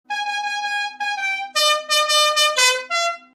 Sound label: La Bamba Musical Car Horn